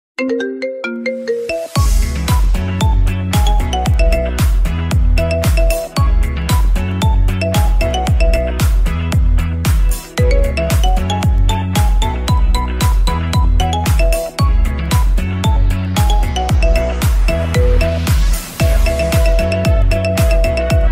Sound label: Marimba Remix Ringtone; iphone